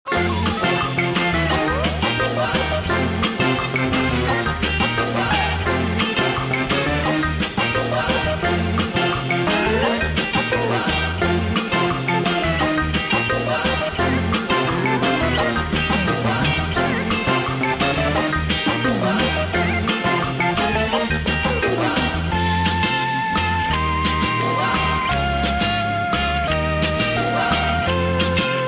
alpha beat

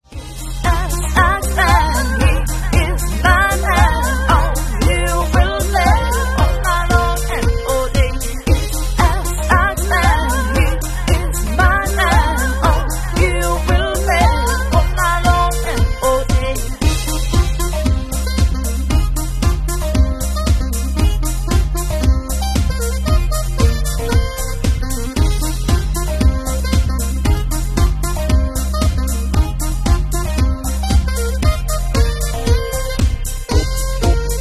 sax man

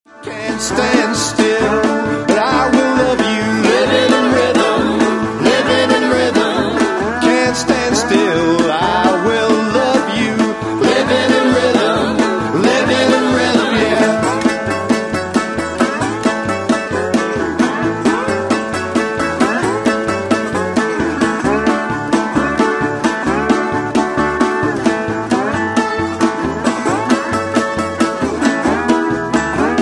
living in rhythm

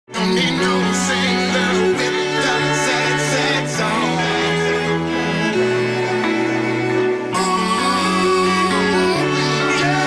sad song

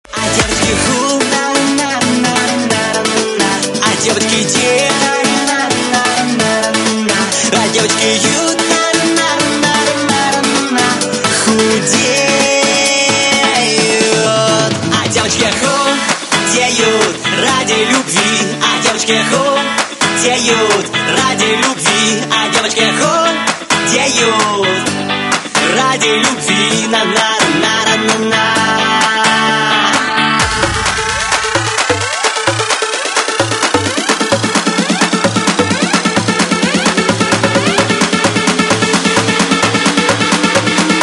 dj scratchin

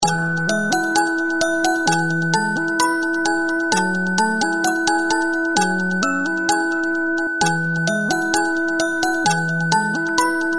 music box

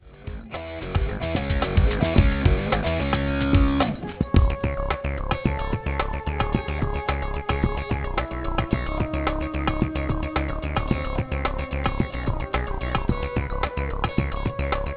8bit, mono

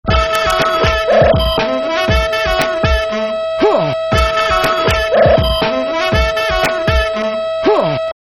funk

